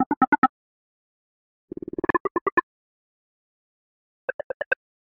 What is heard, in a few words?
Vespa; amazon fire hd 8